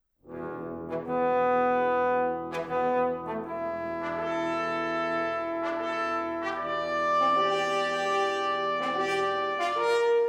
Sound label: Suite for Brass